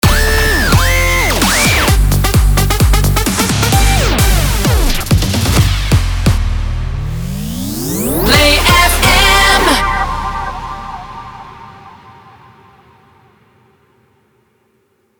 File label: 130bpm Gmin MIX B Ramp